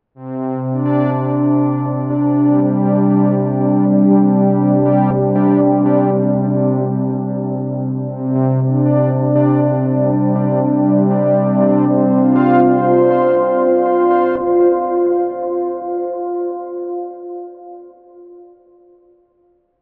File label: Mercury-4 Oversampling; Cherry Audio; 1 x Oversampling